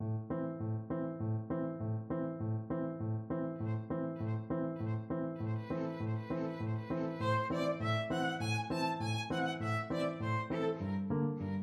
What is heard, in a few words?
violin duet